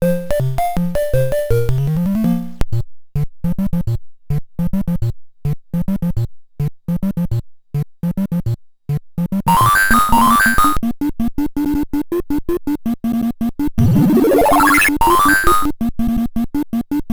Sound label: Arcades; Ambient; zps